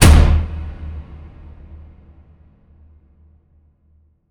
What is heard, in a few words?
impact hatch; Hits